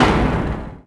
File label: door open metal